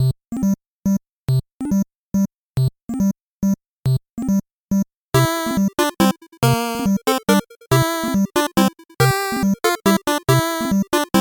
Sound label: 8bit bgm normal